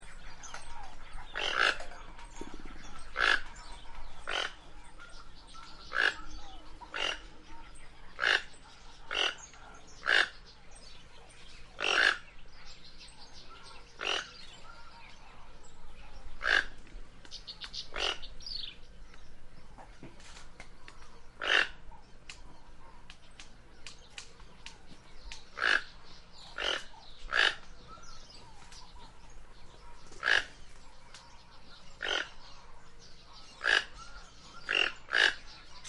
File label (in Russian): Golosa ptic Tukan toko Ramphastos toco